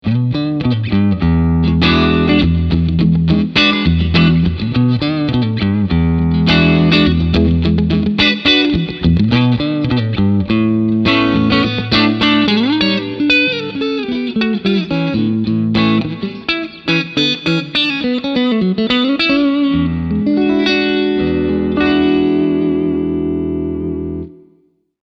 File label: Cleansound II